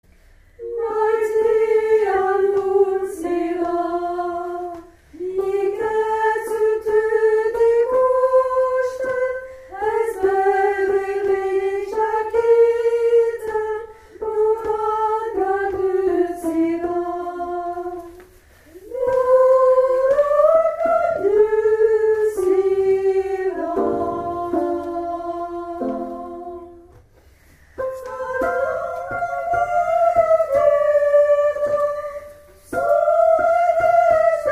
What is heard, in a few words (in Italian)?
Soprani; Maïtia nun zira